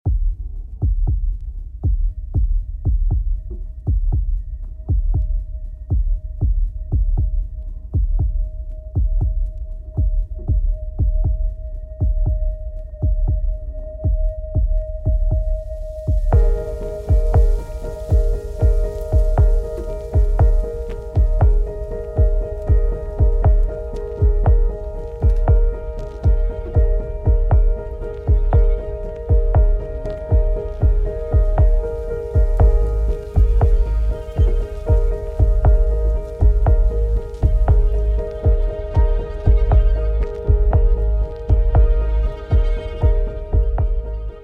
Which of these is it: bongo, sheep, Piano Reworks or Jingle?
Piano Reworks